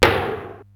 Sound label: SDS9 RM, Simmons SDS-9, Rimshots